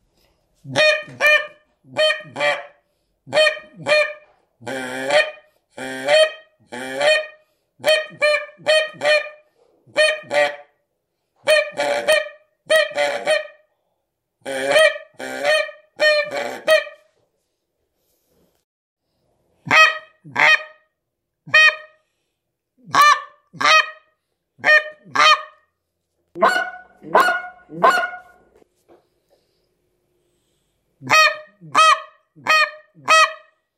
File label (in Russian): канадская казарка